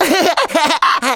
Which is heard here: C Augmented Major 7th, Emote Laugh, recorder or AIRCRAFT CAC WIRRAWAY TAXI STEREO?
Emote Laugh